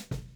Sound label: Snare Top